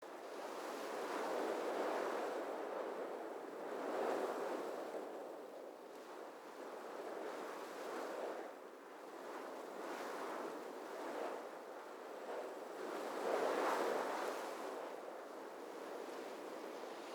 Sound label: Wolf Wind Movement Speed Loop; Animals